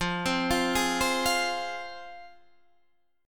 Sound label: G sharp 6th F, chord